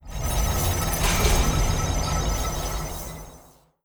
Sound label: habitat amb; Stellaris; utopia